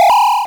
Sonic Skid

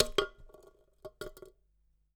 Tin Can

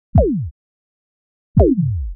Magic Laser Bassy Sine Drop Laser Pew; Gwen

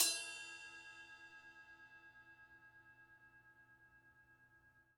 Ride Ping 20 Bell

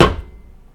Hammer hit

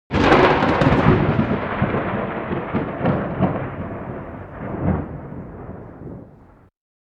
Thunder

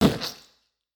Vocal Splat

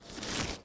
Napkin Extraction

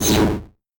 Scifi Door

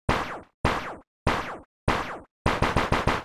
Weird Laser Gun

